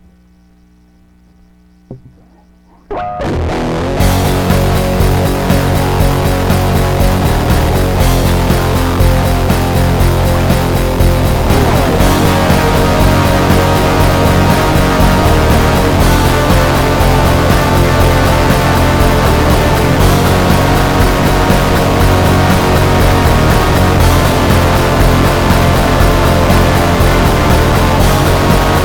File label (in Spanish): black metal asqueroso